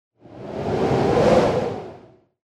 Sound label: wind; Dystopia; injection